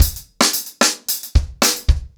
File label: 110BPM, ACOUSTIC DRUMS